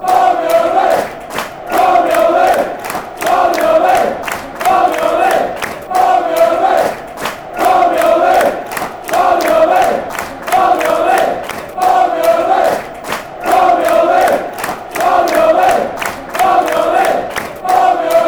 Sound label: barmy army fan chants; dcfc